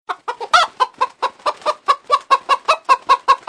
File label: Funny Hen